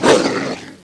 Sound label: wolf attack; Caesar III